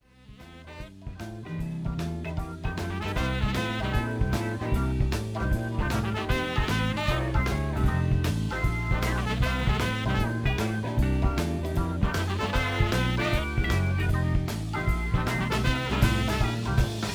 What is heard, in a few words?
CASINO; Big Mutha Truckers